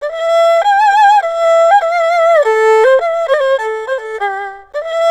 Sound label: ERHU; INIS; Opium